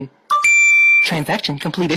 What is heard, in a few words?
transaction completed funny sound